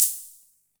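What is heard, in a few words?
Olson Cym B, cymbal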